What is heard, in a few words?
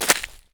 ice footstep; metrocraft